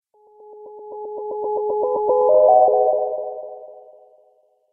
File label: Sound Futuristic Transition, Transition Sound Effects